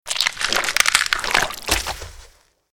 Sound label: wandering eye death; mobs